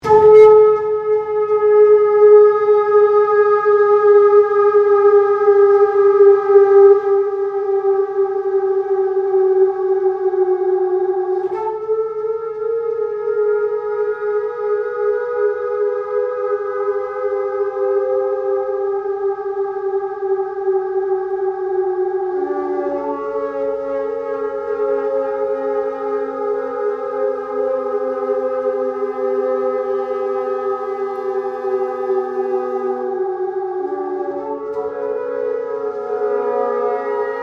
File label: Noise Flute